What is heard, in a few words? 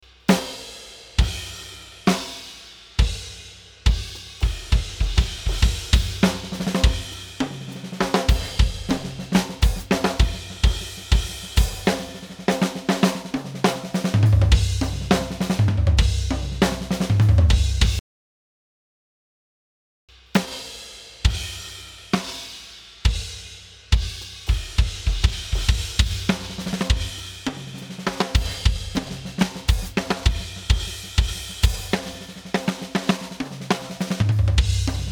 DRUM BUSS TIGHT HEAVY COMP